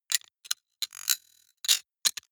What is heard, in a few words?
digital metal clicking, robot; comedy cartoon